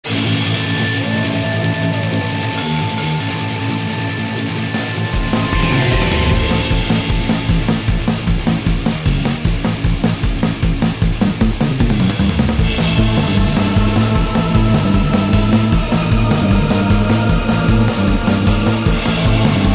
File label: bgm boss; Mighty Knight 2